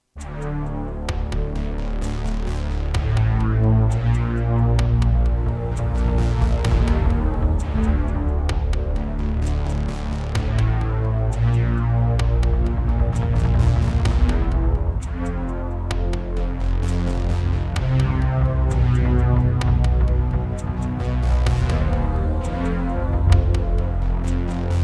Roland SonicCell GM2TEMPLATE; Descent II

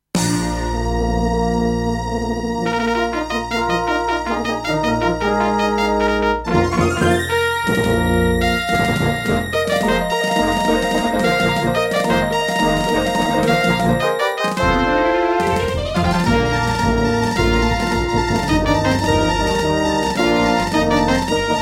Intro, Doepfer GMX-1; Dark Forces

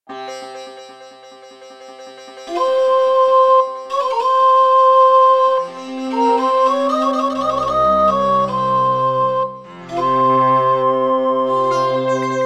Roland Edirol SD-20 GSMODE; Prince of Persia 2 - Intro

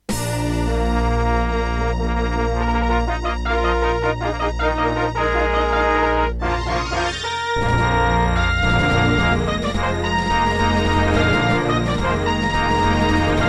Intro, Serdaco McQub; Dark Forces

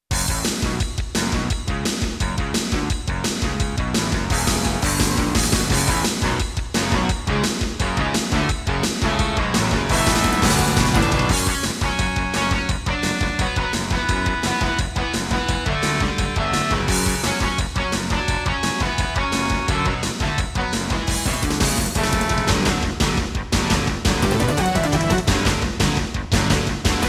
Roland SC-55mkII; Duke Nukem 3D

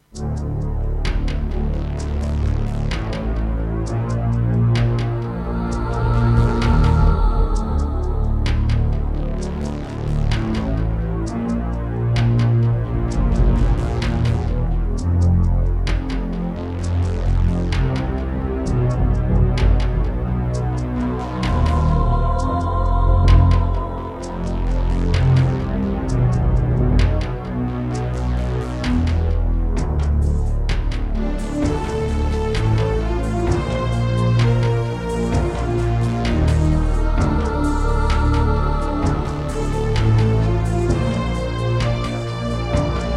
Yamaha MU2000EX GSMODE; Descent II

